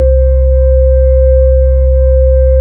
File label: B-3 Hollow; Keyboards of The 60's and 70's